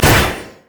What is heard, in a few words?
shield refresh; Diana